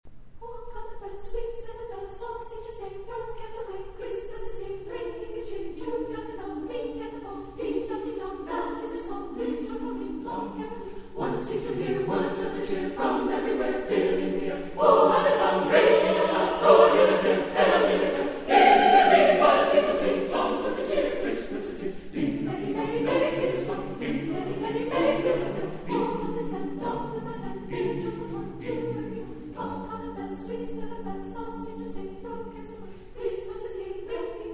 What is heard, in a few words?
Choir Carol of the Bells; St. Joseph Catholic Church Choir